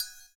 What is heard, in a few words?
CYM, Ethno Techno; ILIO - Ethno Techno